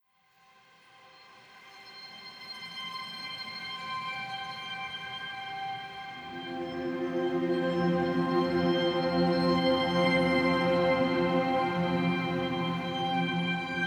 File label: Music for Relaxing Cats, Jazz Music Therapy for Cats